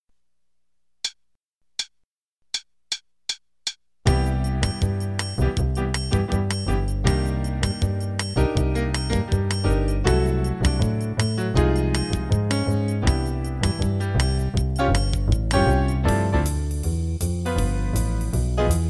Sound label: Play-a-long, Jazz Piano; 8 choruses in C